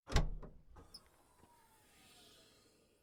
trunk open

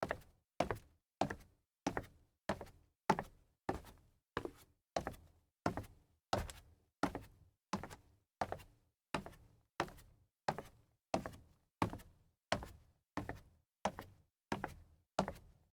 sneaker stairs walk wood

